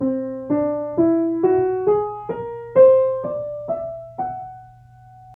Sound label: whole tone scale fast